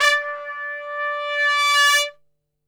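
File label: D 3 trumpet; Best Service; Brass Super Section; TRP SWL; 90 s Sample CDs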